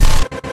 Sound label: race condition; DonkeysAndDroids.Godot